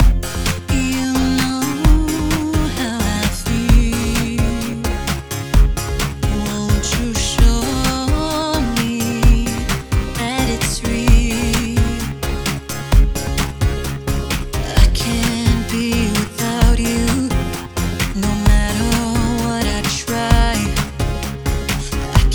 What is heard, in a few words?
funky